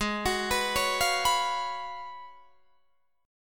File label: Ab Minor 13th, Ab, Guitar, Drop D